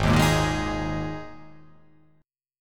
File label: G sharp Suspended 2nd chord, down